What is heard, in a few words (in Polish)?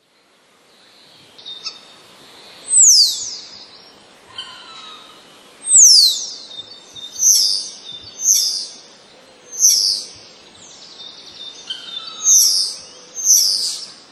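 szpaki, nagranie, na którym można usłyszeć opadające gwizdy, charakterystyczne dla szpaków, głośne, krótkie piski oraz inne odgłosy; wroblowe; zapozyczenia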